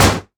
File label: wood door slam